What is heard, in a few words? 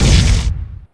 small emp explode